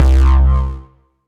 Bruiser, Analog Bass; Ableton; Factory Packs; Retro Synths; Analog Bass Vol 1

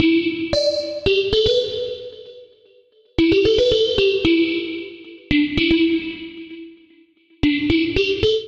Warped Bell C Major 113 bpm; Melodic; Tonal; MIDI Clips; Drive and Glow; Ableton; Factory Packs

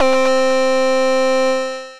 Lead On The Road, Synth Lead

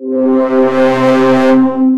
Omni Key, Synth Pad; Instruments; Ableton; Synth Essentials; Operator

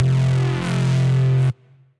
Bass Drone; Wrath Bass Drone

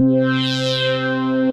In The Lab Lead, Synth Lead; Instruments; Ableton; Synth Essentials; Operator

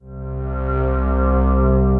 Fundamental Harmonics; Ethereal Pads; Ableton; Mood Reel

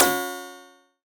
Alien FM Perc Short

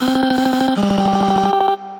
Heavenly Vocode, Transformed Voices; Voice Box; Chromatic Voices

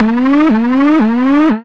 Prowler Groove, Synth Rhythmic

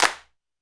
clap; Ableton Foundation FreePacks; Foundation Drums

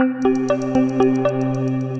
Melodic Arps; Flower In The Night

